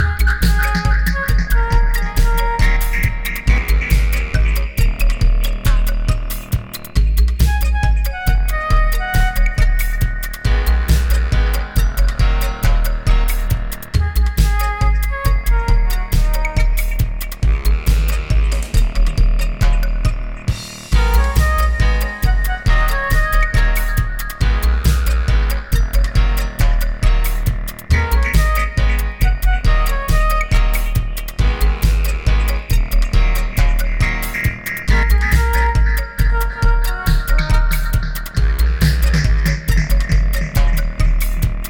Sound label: ugly horn